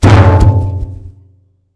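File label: grass; Mafie 1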